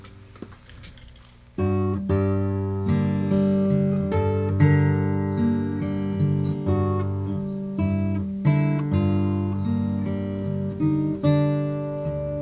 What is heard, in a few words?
open d minor